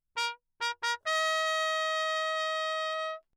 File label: TRP MUTE Bach; Crown; Perinétventiltrompete